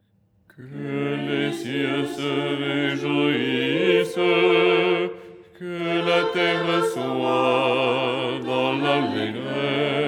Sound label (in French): basse; ton 3